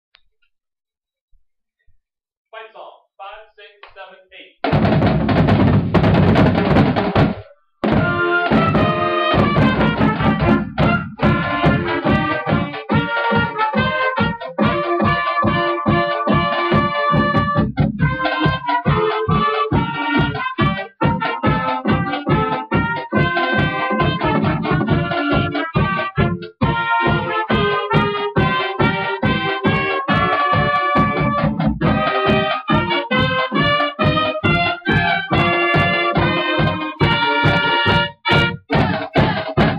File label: fight song; blanchester